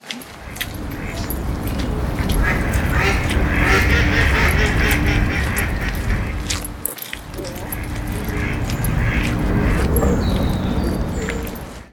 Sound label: walking with ducks